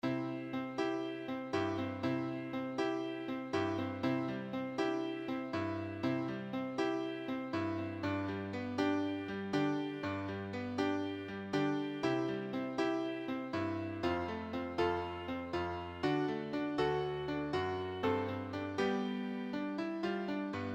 Accomp

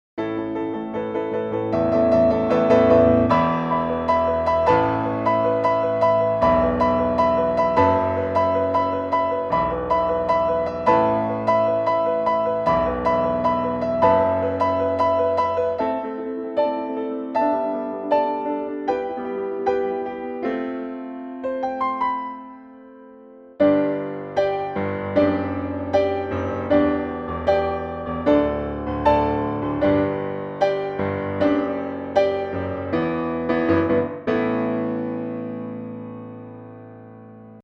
accompaniment only

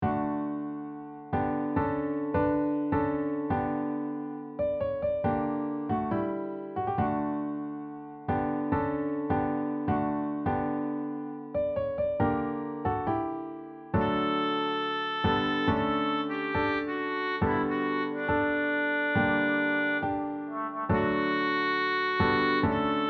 piano melody

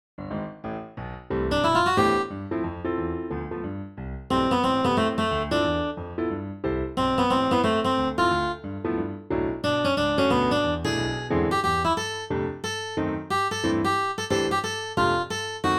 ALTO accomp melody

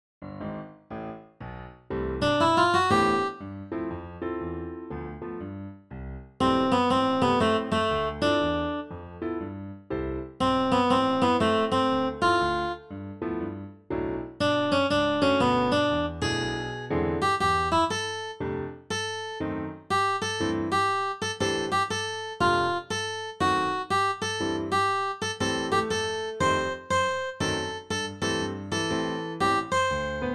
ALTO SLOW accomp melody

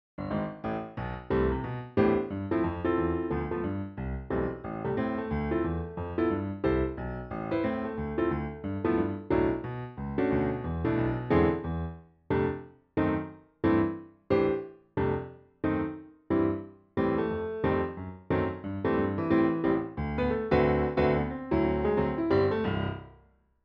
accomp

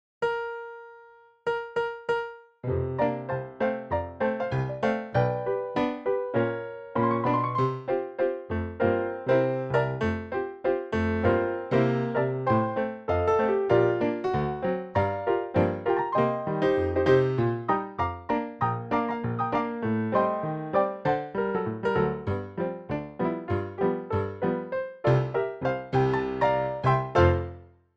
Female Backing Track

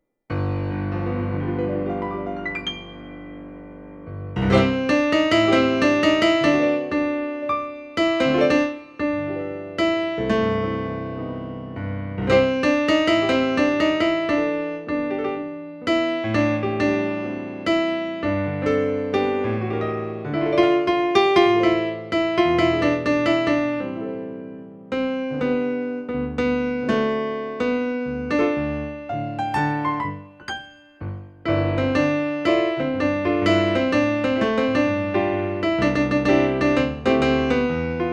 Alto melody; RIVERSIDE THEATRE

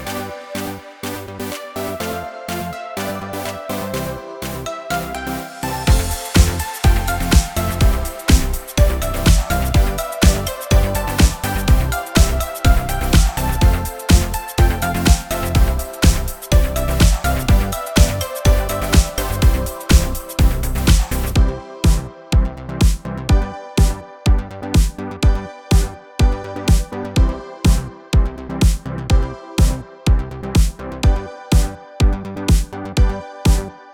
MUSIC

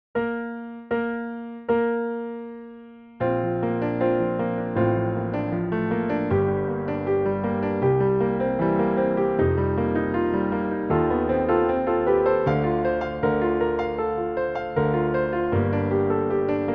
Backing Track

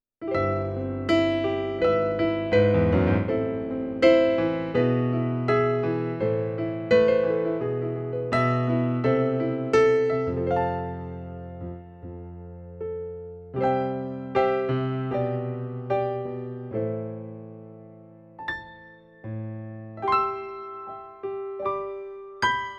accompaniment

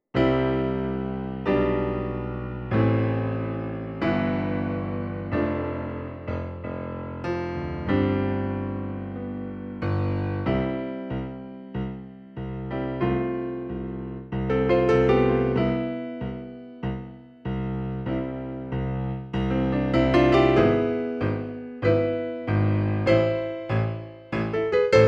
accompaniment

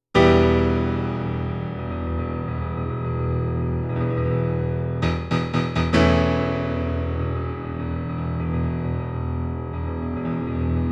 accompaniment

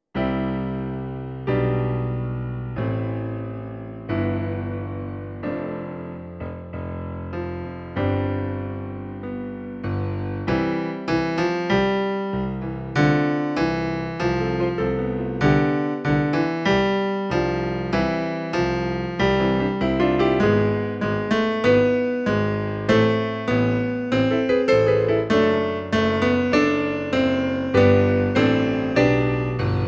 tenor melody

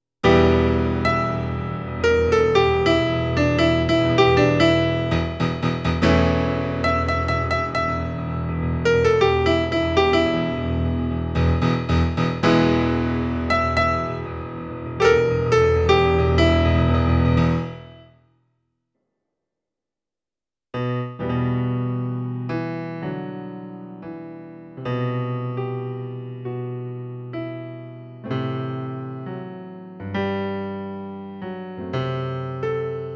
melody

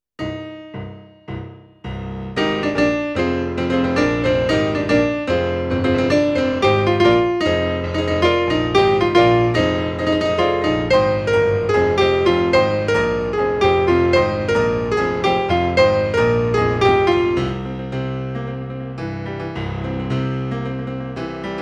melody

